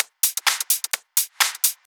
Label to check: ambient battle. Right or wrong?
Wrong